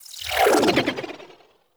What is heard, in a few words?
Heal Wave End Cast; Warframe; Anima Animus